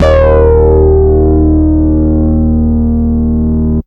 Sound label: Root D#, Bass